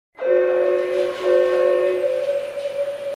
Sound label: Announce alarm; SCP